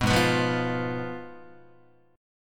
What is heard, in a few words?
Ab Minor 6th, Ab, Guitar; Abm6 strummed; JGuitar